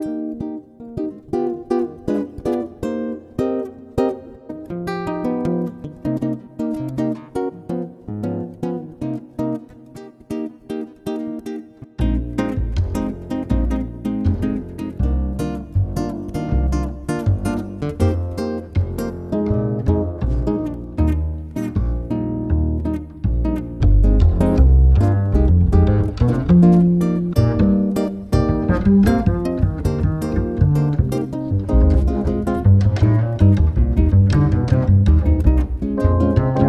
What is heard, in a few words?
Coffee House Jazz